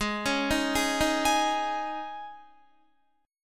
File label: Ab Suspended 4th, Guitar; arpeggiated; Absus4